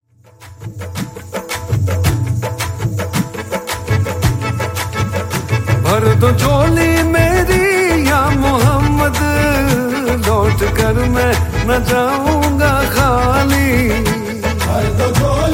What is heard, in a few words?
Islamic Ringtone